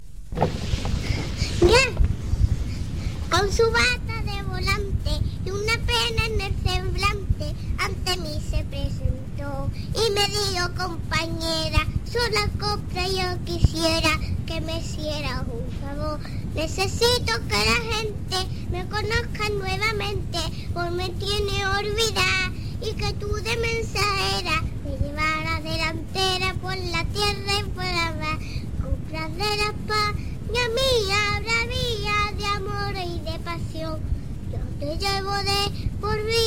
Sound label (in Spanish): copla